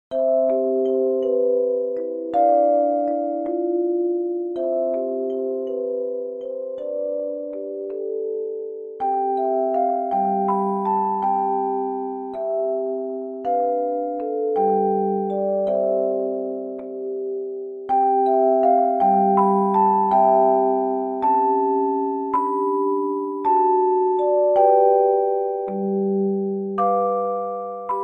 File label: Stories of Jesus medley chimes